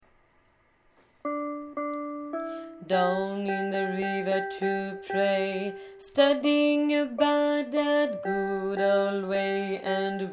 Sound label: basses